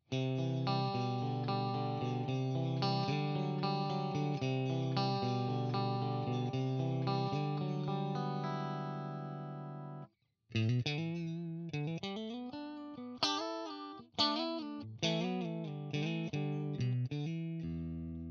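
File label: Fender Am Pro mid bridge CLEAN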